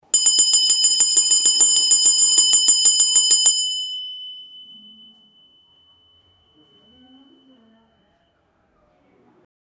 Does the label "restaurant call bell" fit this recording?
Yes